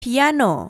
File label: piano